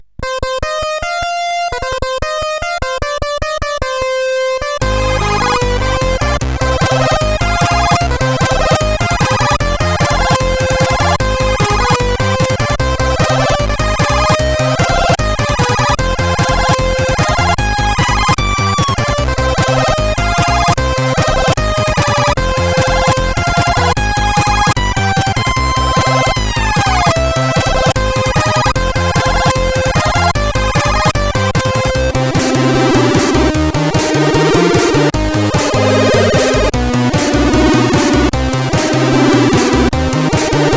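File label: Chiptune, CSG8580R5; Dopeland Rythem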